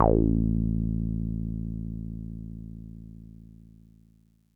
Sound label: TB A1; Music Store Sound Service; Einstein